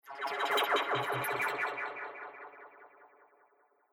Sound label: Mystery Laser; Mystery Laser Fx; Laser Sound Sound Effects; Sci-Fi Laser Sound Effect; Andrew Fleming